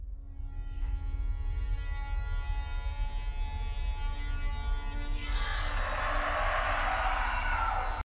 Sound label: screech; the mean one